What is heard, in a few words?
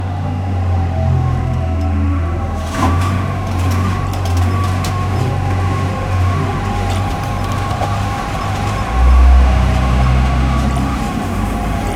truck drive, animation; pizza planet